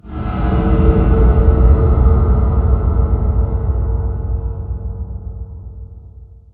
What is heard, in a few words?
Ambient; Barotrauma; Room FX